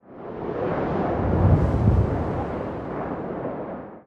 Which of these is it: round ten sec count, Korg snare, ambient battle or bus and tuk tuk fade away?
ambient battle